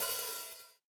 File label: RX8 HH open, Yamaha RX-8